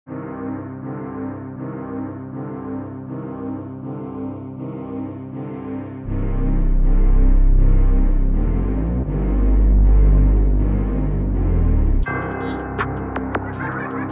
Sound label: 160bpm, Beats; paganini